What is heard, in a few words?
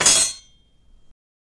drop sword